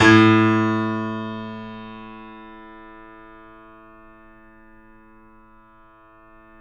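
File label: piano, A, right; InVision Interactive - The Piano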